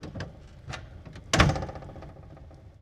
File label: 1948 Diesel Truck Door Open Close; Period Vehicles